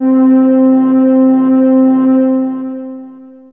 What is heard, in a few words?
String O C4, Fairlight CMI IIe Lo String; 11LoString